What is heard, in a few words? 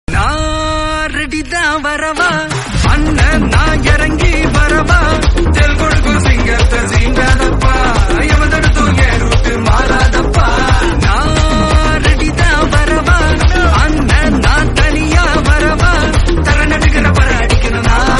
Tamil Rap; Leo